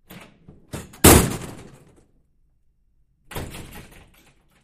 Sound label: Door Push Bar Wood